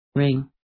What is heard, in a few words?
ring